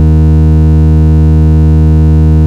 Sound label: Bass D#; Vengeance Samples; Vengeance Ultimate Bass